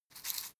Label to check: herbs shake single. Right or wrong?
Right